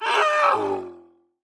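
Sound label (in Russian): рев монстра аааау жалобный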